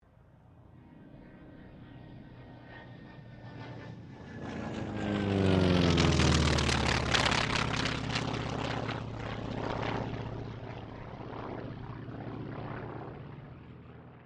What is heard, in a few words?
AIRCRAFT T-28D TROJAN PASS; Distant; Airplane Sound Effects; Airplanes (Sound Effects:Vehicles)